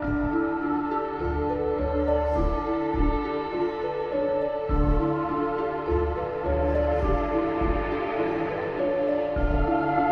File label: voice and strings; Sad; Loops